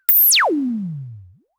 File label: Dual-Percussion-Synthesiser; Klone